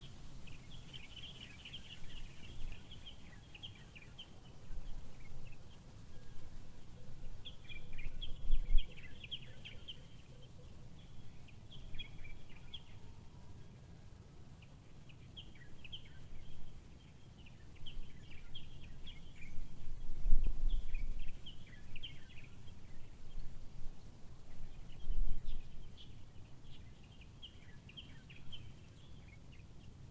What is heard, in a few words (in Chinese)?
高爾夫球場; 花蓮高爾夫球場; 花蓮市化道路120號